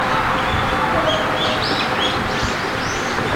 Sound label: Baers Pochard Aythya baeri